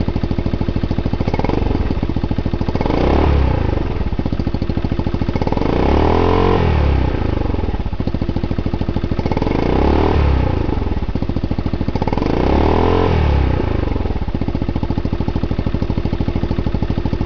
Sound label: minisound, muffler; majesty; バッフル付き; コマジェ; バッフルを付けると、 高音域が若干増加する感じです。; 純正マフラー